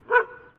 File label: Dog Bark; DogBarks 1.0